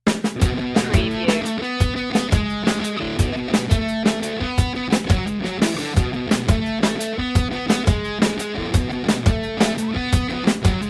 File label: punk pop underscore